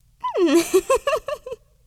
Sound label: Laugh